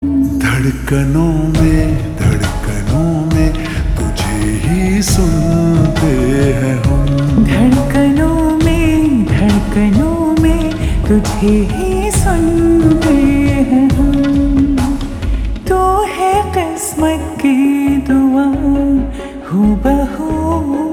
Ringtone Slowed Reverb

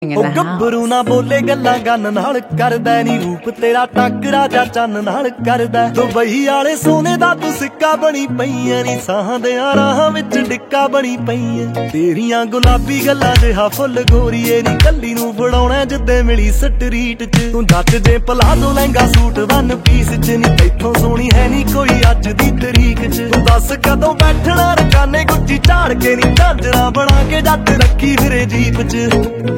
Ringtone

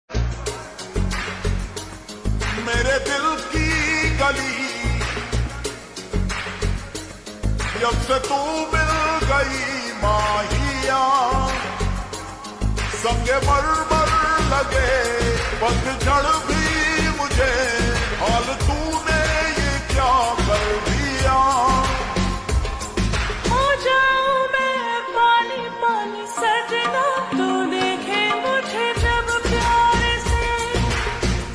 Slowed Reverb Ringtone

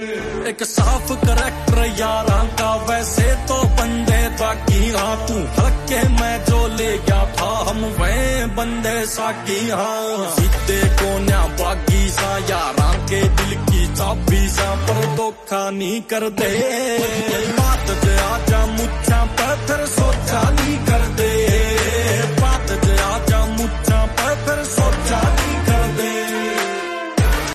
Ringtone

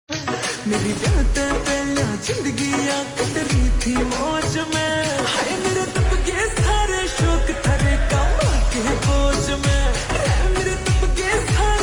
Ringtone Slowed Reverb

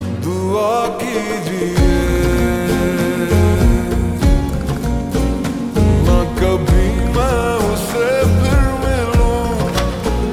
Ringtone Slowed Reverb

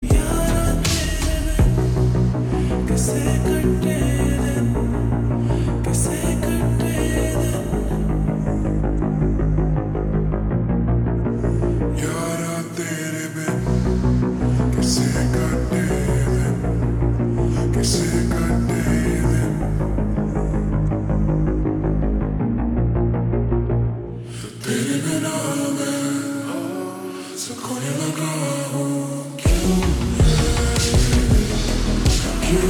Ringtone Slowed Reverb

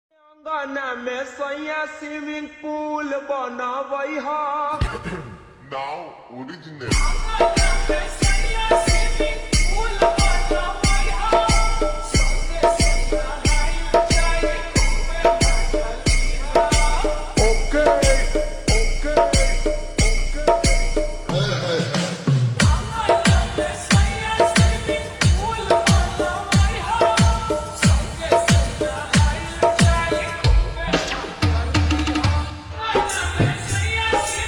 Ringtone Slowed Reverb

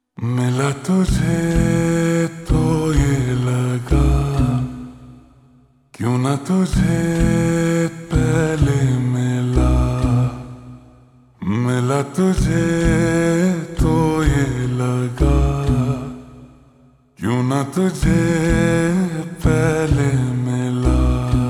Slowed Reverb Ringtone

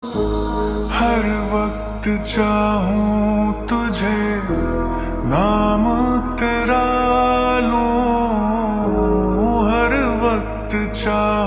Slowed Reverb Ringtone

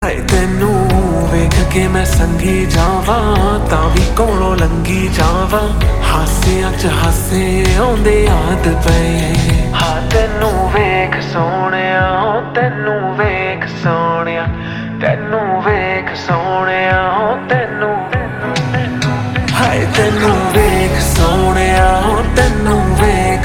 Ringtone Slowed Reverb

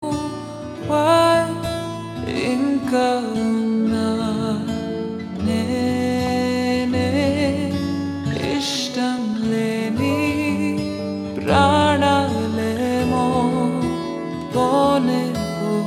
Ringtone Slowed Reverb

